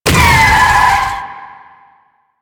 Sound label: bike damage; Rusalka; Warframe; NineteenNinetyNine; Stage8ShitboxConvoy